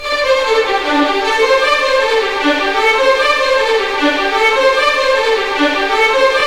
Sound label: RIVER; East West; Scoring Tools; ORC; River Flow